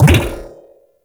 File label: Shock Rifle Projectile Bounce; Warframe; Weapons; Corpus